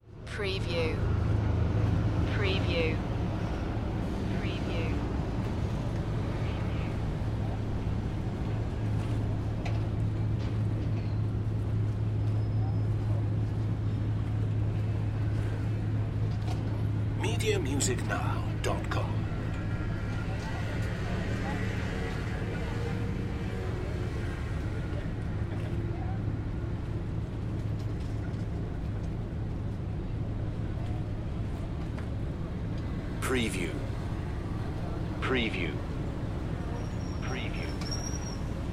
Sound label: Amsterdam Harbor Ambience